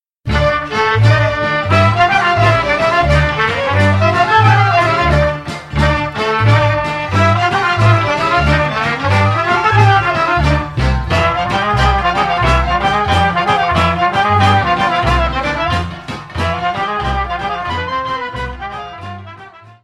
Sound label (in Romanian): Suita folklorica din Bucovina, Roumanie